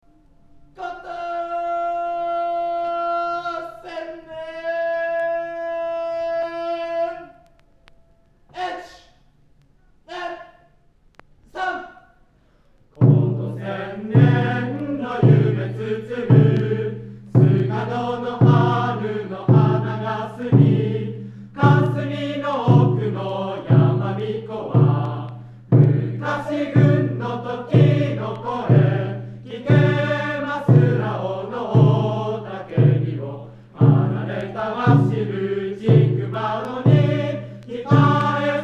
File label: koto; tombo